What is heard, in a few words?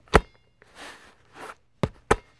Football Handling